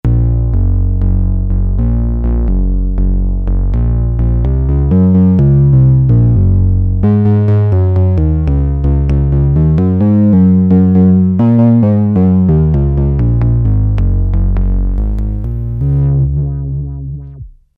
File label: Novation XioSynth 25